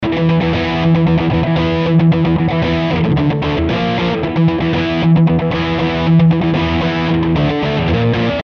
Verse Art Rock E

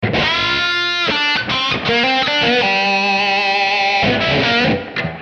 G29 98 blues lead

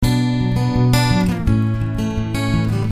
Picking Bm,A 83

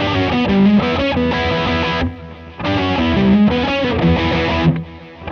90 Verse Rock E; Loops

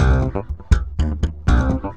C 120 Steppin Funky

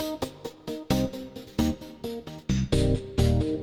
Funky 125 Cm7; Loops

